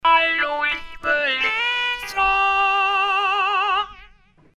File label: Dunlop MXR M222 Talkbox tone gain 0